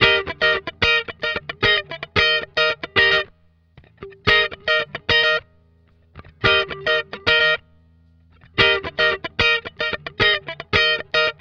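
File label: 112 C SUGAR FUNK guitar, 112 BPM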